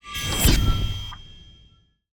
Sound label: Spear SKILL buff; Cielonos